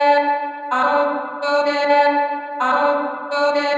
chopped vocals female Dm 109

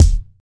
acoustic kickdrum d sharp

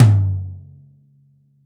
long room reverb tom drum one shot g key; tuned drums g key

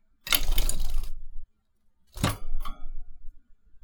canary in birdgage wings flapping

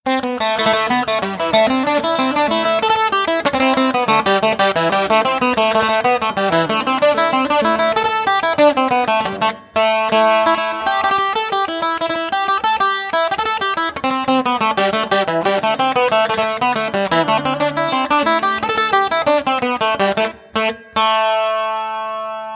irish bouzouki folk pickup sequence; loops

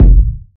subtle reverb kick one shot f sharp key; kick drum

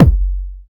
focused bass drum single hit f sharp key

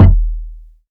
long tail reverb kick d sharp key; kick drum samples

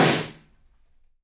huge snare sound a sharp key

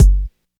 mid range kick single hit g key; kick drum samples

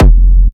industrial techno kick oneshot - f; kick drum samples

